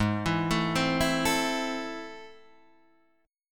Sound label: A Minor Ab, guitar; Am/Ab arpeggiated; JGuitar